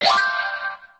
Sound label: button; wrestle jump